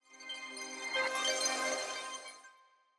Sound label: shutdown, phonetones; China Telecom